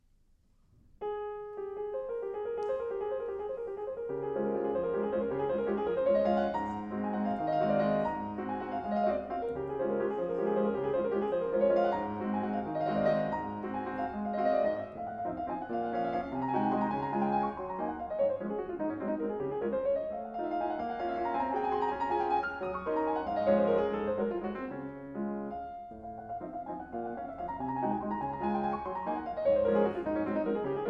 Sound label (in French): Valse en re bemol majeur; pianestival; Thierry Goldwaser; Chopin; Valse op 64 n°1